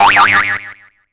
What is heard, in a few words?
Appointment Melody; Siemens; CF62